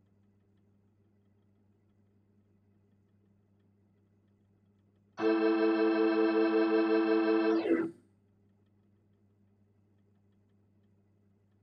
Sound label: Hammond